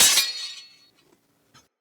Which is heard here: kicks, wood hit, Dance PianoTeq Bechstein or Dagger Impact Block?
Dagger Impact Block